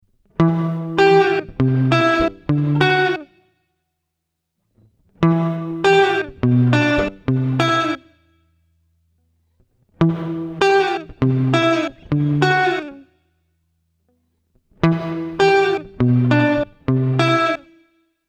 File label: Reverb; Rainger FX; Reverb X 2